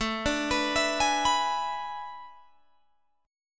A Major 7th Suspended 2nd Suspended 4th, Guitar, Open G